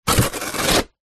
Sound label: card cereal box rip; foley